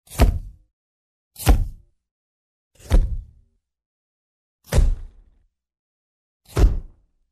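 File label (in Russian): старинная вспышка фотоаппарата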